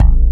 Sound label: V-S BASS